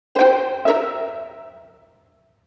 Ominous Plink Orchestral 120 bpm; Ultimate Everything Music Bundle; Stingers, Blips and Dings

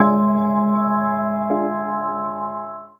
jingle careful short; Survival Metroidvania Vol1

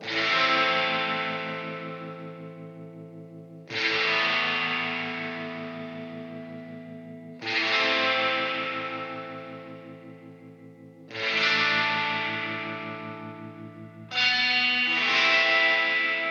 Timed Robbery Alt Guitar Only LOOP; Wild West Music; Action

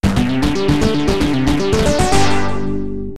Retro Victory; Retro Victory, Defeat and Briefing (Mixed Systems)

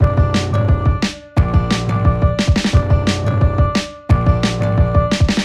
Tension Skirmish Modern 176bpm; Ultimate Everything Music Bundle; Loops for All Occasions

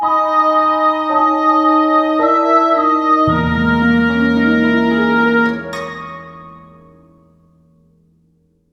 Sad or Mysterious News (Orchestral, 55bpm); Ultimate Everything Music Bundle; Stingers, Blips and Dings

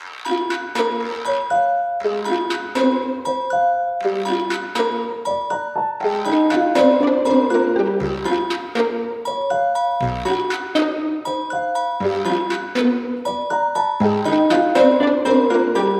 Dialogue or Minigame (Modern 120bpm); Ultimate Everything Music Bundle; Loops for All Occasions

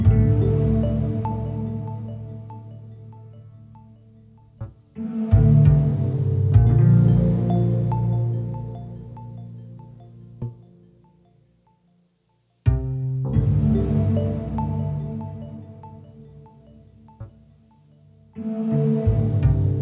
Loops; Abstraction; Patreon Goal Reward Loops

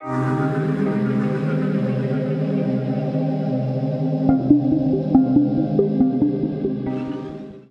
jingle neutral long; Keep Going; Survival Metroidvania Vol1

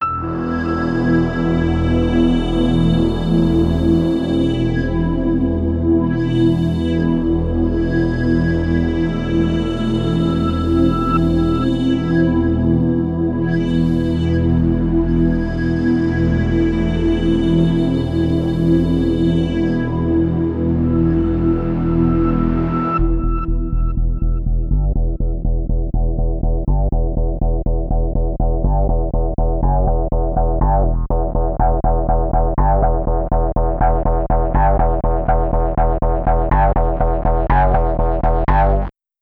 Electric Dungeon 122bpm; EXPLORATION LOOP; SAGA Complete Collection v2.0; SAGA II - Emotional Exploration

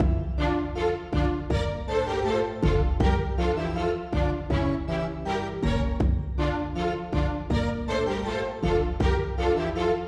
Baroque Rush loop, Cinematic Battle Music

